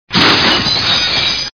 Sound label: crash; Letterman